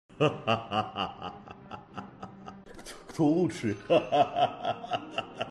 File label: mu hahaah